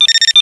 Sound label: hack